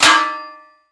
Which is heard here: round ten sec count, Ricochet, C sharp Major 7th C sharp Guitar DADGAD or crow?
Ricochet